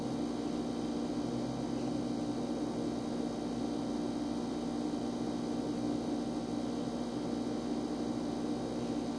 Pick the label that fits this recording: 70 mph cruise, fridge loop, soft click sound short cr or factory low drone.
fridge loop